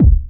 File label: bass drum, Drum & Bass, 140 BPM; Ueberschall; Drum & Bass Resonance